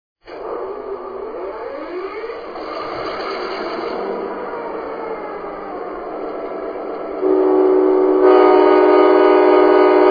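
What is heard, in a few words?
MP15DC 5 chime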